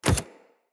companion step default, footsteps; Pets; Zanuka

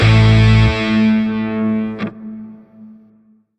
Shawzin Yo Chord K; Warframe; Grineer Shawzin

